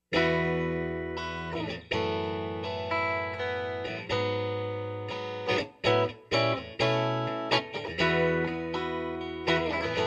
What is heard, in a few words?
Just Funky Strat; Ragin Cajun